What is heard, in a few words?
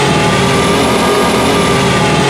in CMED2, F1; AOS F1 2022